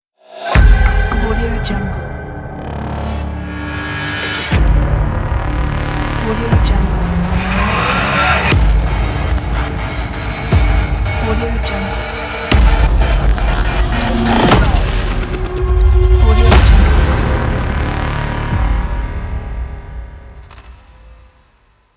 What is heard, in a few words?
action blockbuster logo